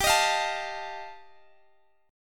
G 7th Suspended 2nd Sharp 5th, G, Guitar, Standard; G7sus2#5 strummed